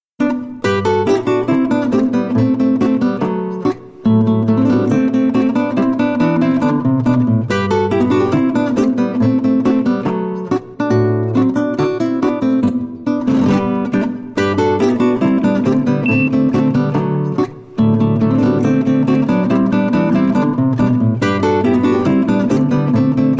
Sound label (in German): Boarischer; gitarrenheft